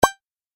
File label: Pop Up Ding